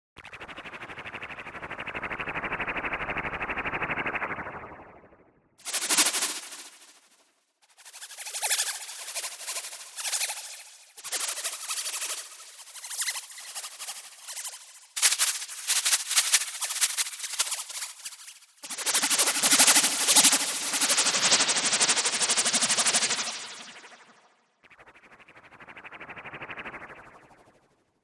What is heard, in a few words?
Spooky Synth